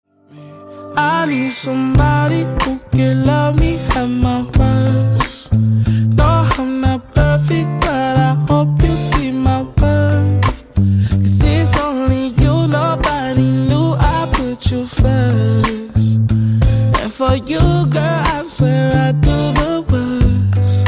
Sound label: Samsung Galaxy A32